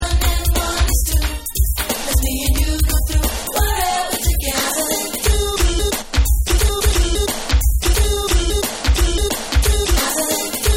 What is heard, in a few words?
Go Go Swing Dub